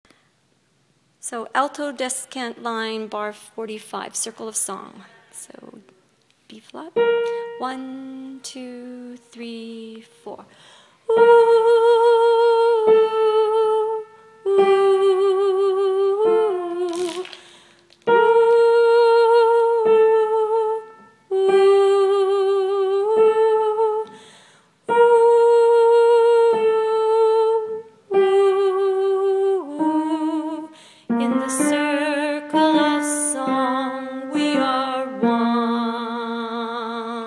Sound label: Circle song Alto from descant